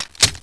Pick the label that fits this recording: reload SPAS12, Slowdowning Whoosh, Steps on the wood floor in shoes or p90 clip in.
p90 clip in